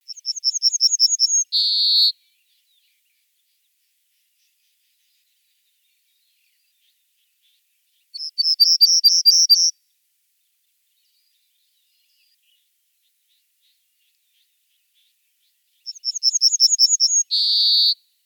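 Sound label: pine bunting voice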